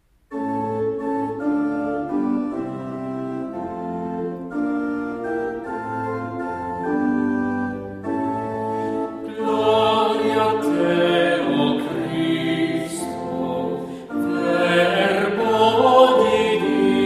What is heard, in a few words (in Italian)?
Acclamazione al vangelo melodia; Durighello; Acclamazione al vangelo 4